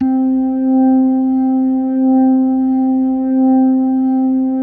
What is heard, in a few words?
C4S, B3